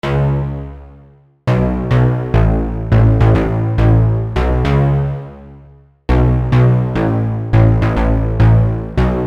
pluck, Pluck Synth

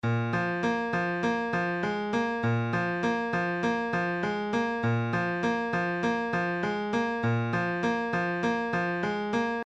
piano

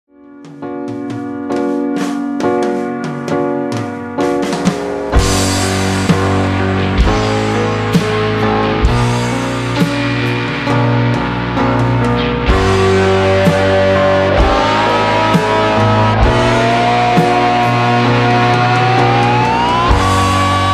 guitar

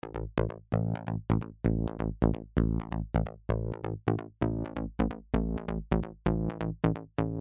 bass

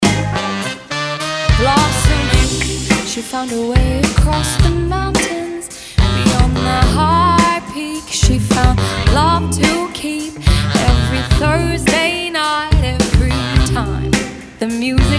allchannels 35 48db lopassed

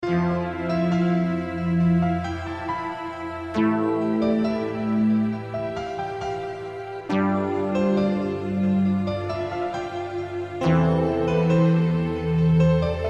sad r b beat

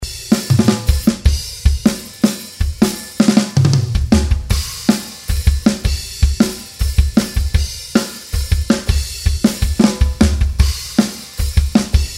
high low drumpart

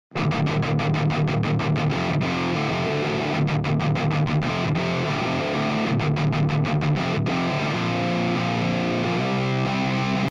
test heavy, gitarrenaufnahme; Probleme bei Gitarrenaufnahme Phase

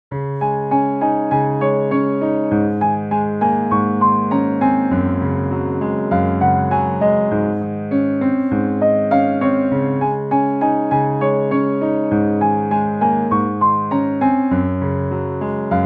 vienna, piano; piano plugin

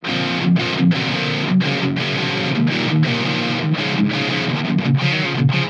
signal mic

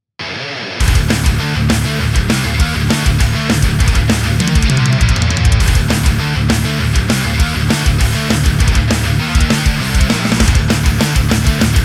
riffing; thrashing new year

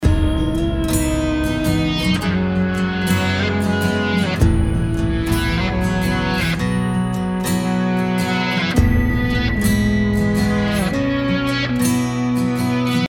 reverse guitar

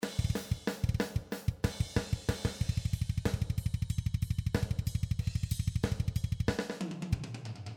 testmix drums

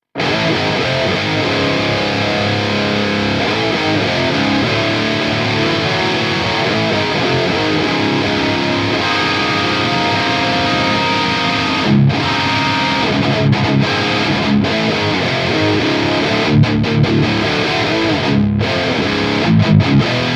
EVH 5150 III EL34 50W

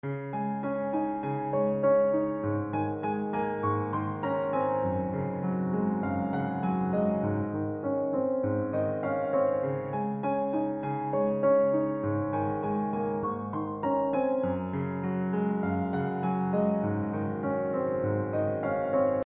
steinway

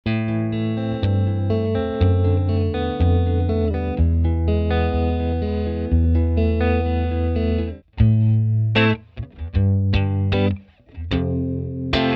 jet 12t; ampeg jet 12 gitarrenverstaerker; mikrofontest; a-b vergleich

